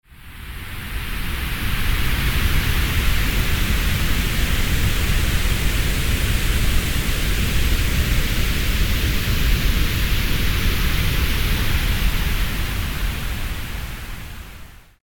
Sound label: Sweeping Grey Noise